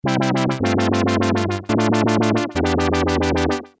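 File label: lfo filter; nord3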